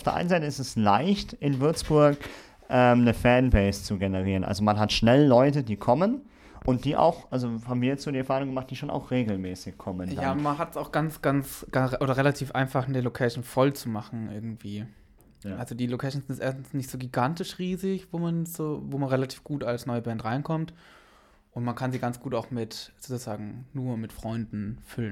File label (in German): Junge Band in Würzburg